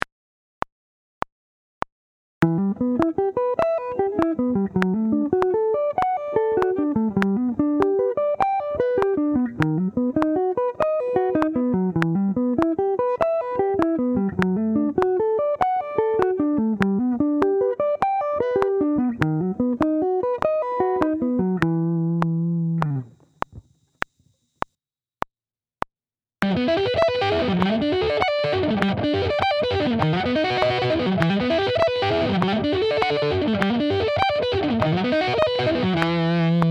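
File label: Sweep Picking, guitar; Sweep Picking Part 3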